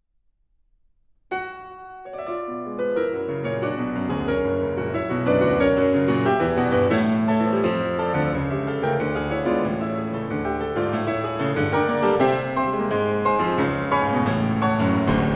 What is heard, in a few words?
Rhapsody for Piano in B Minor